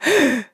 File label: death fem, player